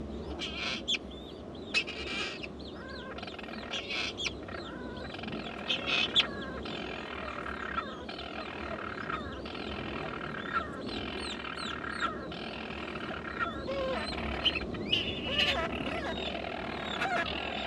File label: Agonistic calls and burrow calls